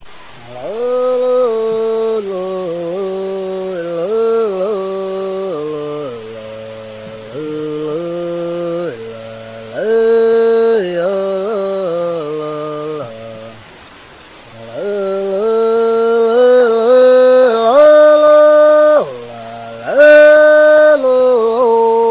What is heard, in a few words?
saami trad